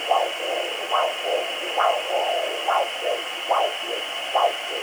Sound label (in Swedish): puls